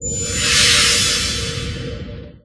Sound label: flash